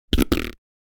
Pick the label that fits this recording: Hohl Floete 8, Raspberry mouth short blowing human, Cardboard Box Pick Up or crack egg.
Raspberry mouth short blowing human